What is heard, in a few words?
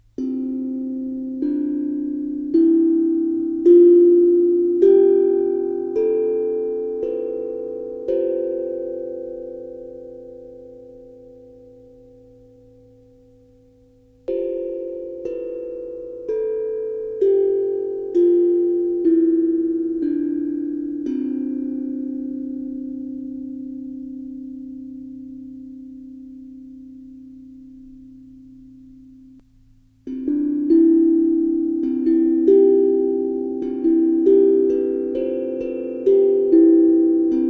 chakra drum; mini